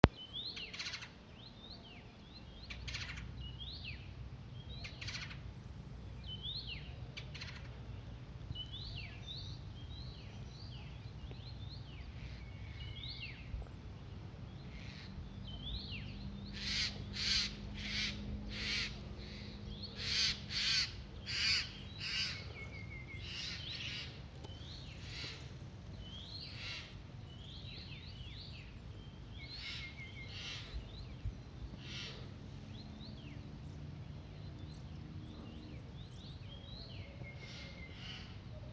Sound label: Garrulus glandarius; SETE